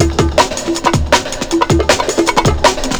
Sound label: break